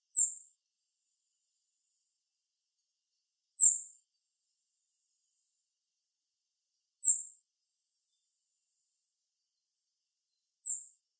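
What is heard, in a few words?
White's thrush, utsunomiya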